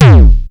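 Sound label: bass drum zap hard; 300 Drum Machines; Yamaha Motif ES-Series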